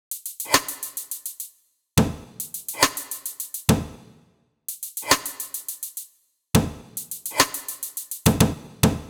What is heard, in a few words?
105, Perc; Pulse